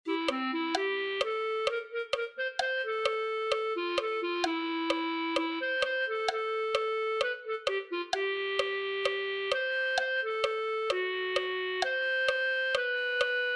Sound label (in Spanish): clarinete